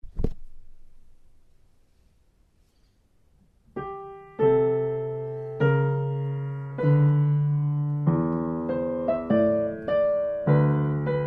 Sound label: Chorus